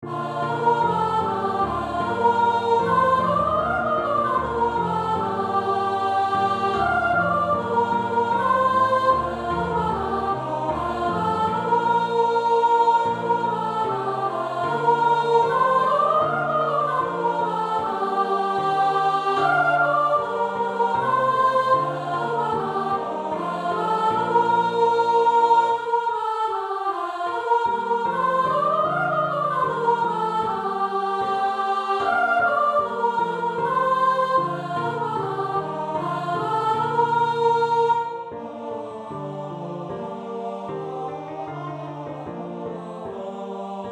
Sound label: Soprano; The cherry tree carol